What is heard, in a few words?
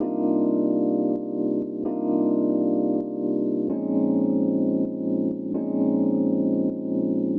Epian Loop 130; Equipped Music; Loungin House